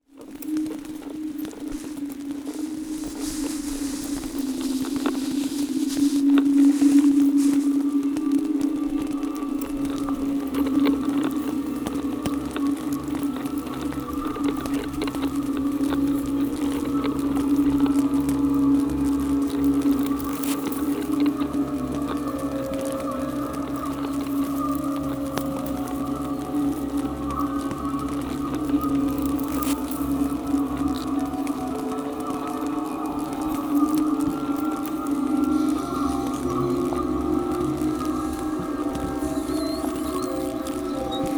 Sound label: lines of breath; murmer